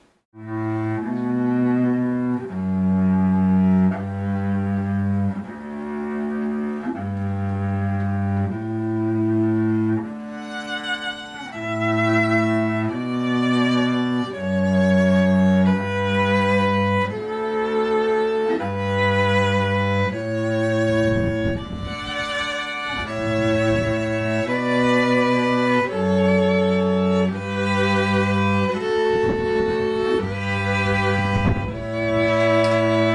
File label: strings quartet violins viola cello